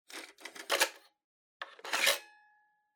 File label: Portable Stereo MD Eject